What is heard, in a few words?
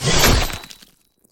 Heavy Floor; Scrap Weapons; Zomboid